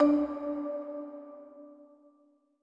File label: marimba; Sky Children of the Light gamerip